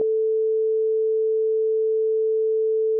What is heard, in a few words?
interview ambient